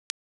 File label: ideal steel wood ball